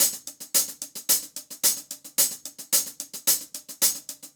Hi-Hat Groove

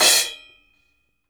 CHOKE, CRASH; Sampleheads; New York City Drumworks VOL-1; SP CRASH

